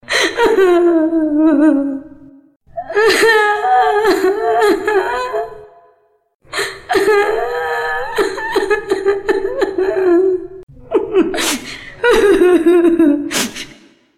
crying

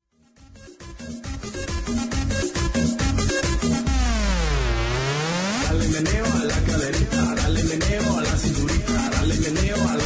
tribal mix shuffle tribal

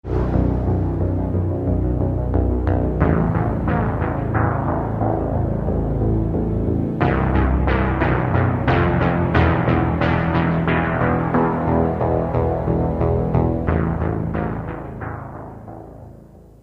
death; mass effect

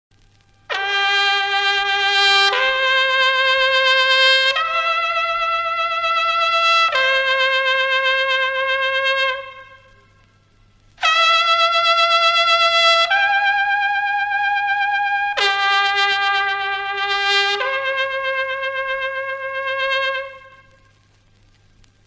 reveille; PLA

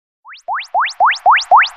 high quality message; ringtones; sms

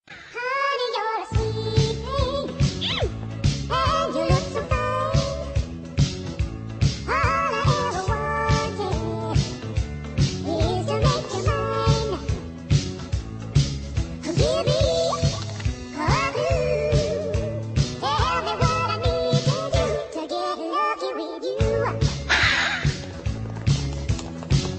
chipmunks girls; rnb

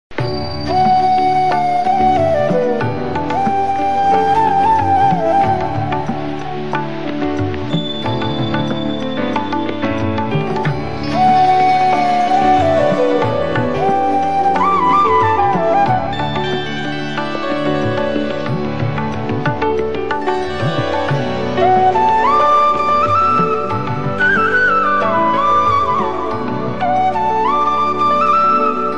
flute; classical

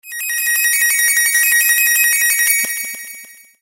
office ringtone high

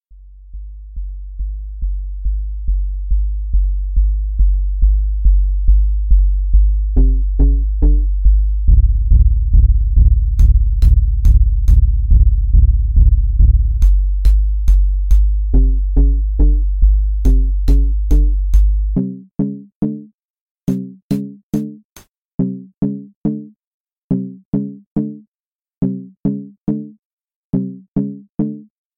Buildup Beat

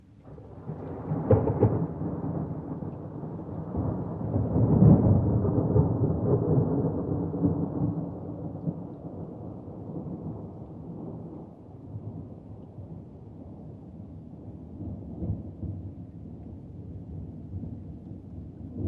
thunder sound; ringtones

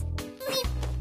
Laugh

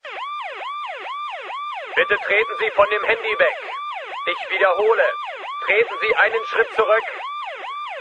Alarm Tone; Handy

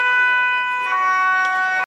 Police Hi Lo

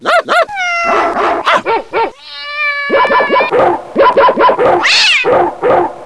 Cat and dog fight

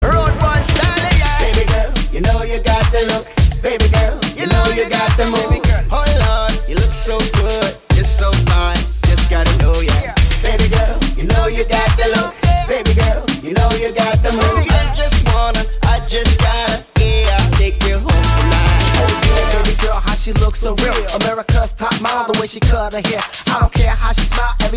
Baby girl Reggaeton

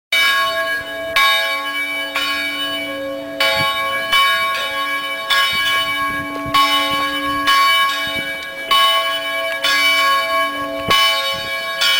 Small Bells

